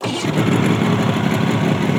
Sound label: Forest machine Engine Start